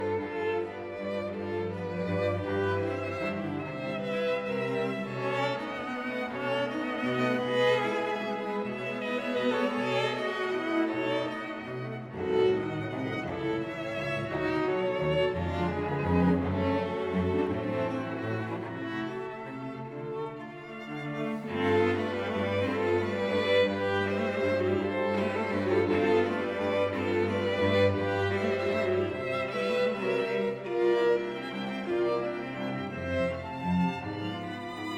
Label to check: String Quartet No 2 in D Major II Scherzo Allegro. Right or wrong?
Right